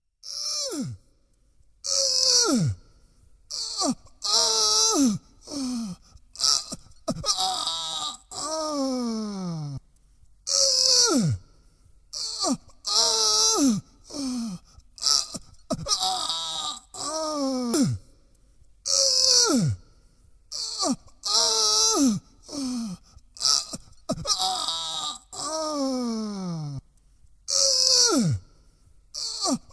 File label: Aaah Aaah